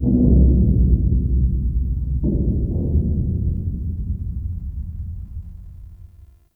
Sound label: Distant Metal Bang; Ambience; Warframe; Corpus Railjack